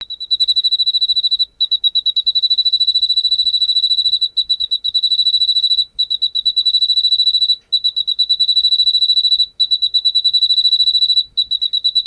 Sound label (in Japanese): ryuukyuumatumushi